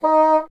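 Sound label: wind bassoon D#; Miroslav Vitous - Solo Instruments VOL-1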